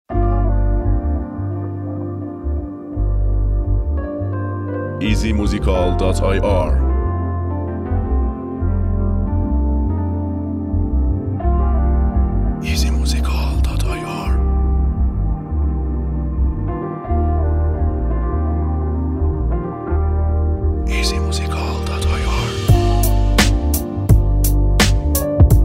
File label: Beat